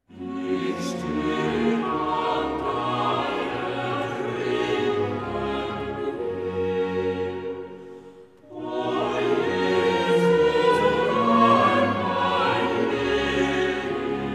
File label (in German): Ich steh an deiner Krippen hier tutti